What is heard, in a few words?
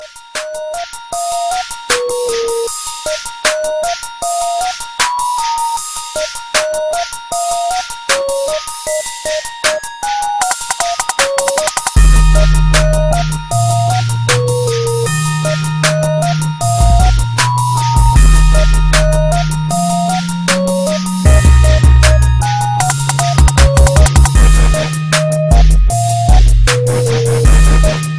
Oppo Ringtone